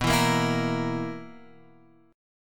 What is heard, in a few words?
B Major 7th Suspended 4th B down Guitar Open F; BM7sus4; strummed